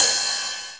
ride sample B key, ride cymbal sound tuned to the B note. Loudest frequency: 8811Hz